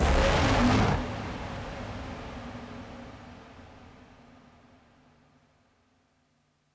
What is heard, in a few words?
Scream